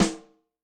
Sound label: Trash RIM, Snare; Yamaha DTXpress-II